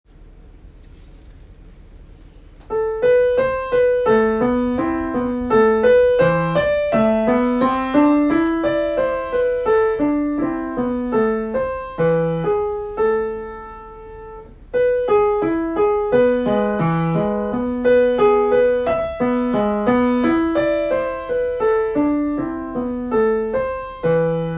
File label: Canon in A Minor, Piano; Cornelius Gurlitt; Piano Studio of Bernadette Geras